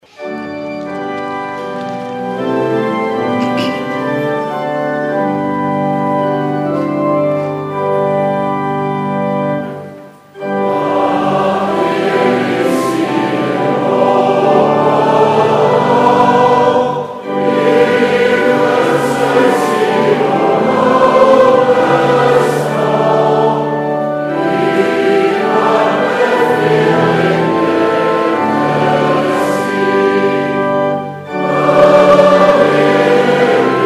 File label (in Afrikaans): Gemeentesang; Ps 134-1 vers 4